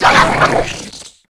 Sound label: serpent; tf2pets